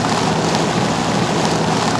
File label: drv grvl, Impact, Cars; XBOX